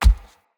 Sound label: Step Default, Animals, Foley, Steps; Bromius